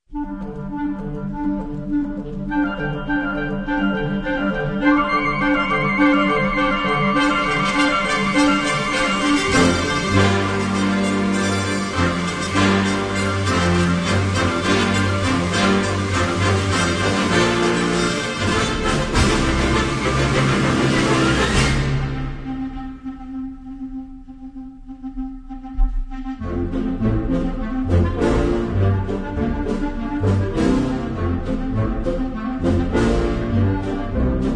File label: Wind Ensemble; Edmonton Wind Ensemble; Snake Fence Country - A Rural Holiday